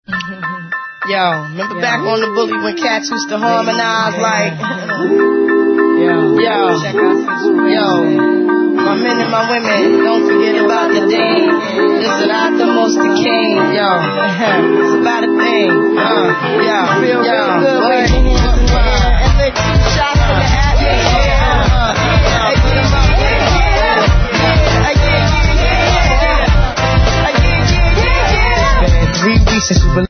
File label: doo wop; globo